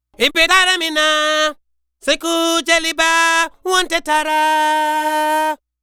African Harmony Vocal; Continental Drift Aftershocks; Vocals

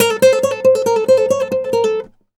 Flamenco, Guitar, a

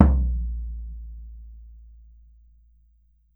BOMBO, Brazil Drum Perc; E-MU Producer Series Vol. 7 – Old World Instruments

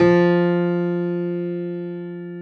piano F2; AKAI S-Series CD-ROM Sound Library VOL-8

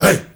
HEY HEY

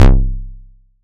SY QK BASS; ModelCycles

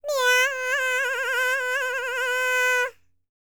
PERSIAN, World Voices; ILIO; Vocal Planet VOL-5

